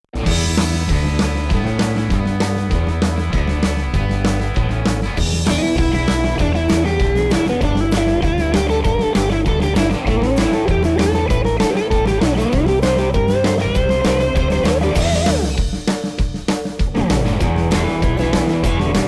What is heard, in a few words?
Blues